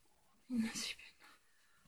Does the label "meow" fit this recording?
No